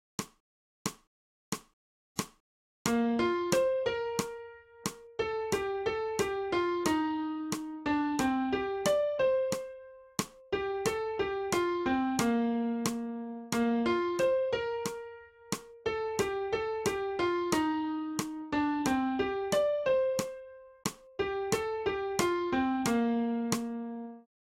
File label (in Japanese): 新フレットレス